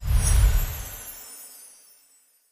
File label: free Spin Reel Spin; fairyhansel